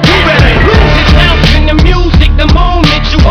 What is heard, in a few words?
loop, radio loops